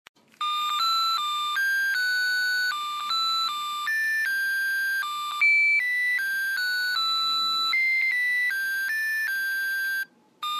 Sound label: birthday cake toy sound